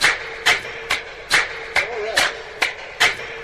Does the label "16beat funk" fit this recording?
No